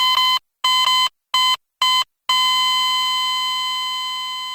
death; gmod

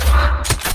reload gears

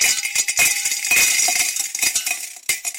coins drop

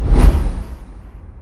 whoosh

